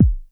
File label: 909 bass, Drums, Roland SH-09